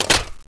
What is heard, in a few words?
clip in; airburster